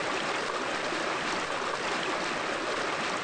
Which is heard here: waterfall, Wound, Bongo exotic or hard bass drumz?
waterfall